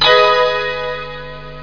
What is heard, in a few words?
glasses